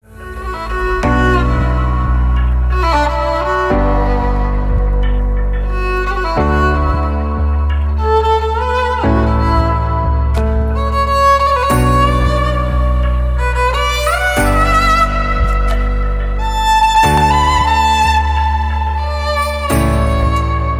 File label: Violinist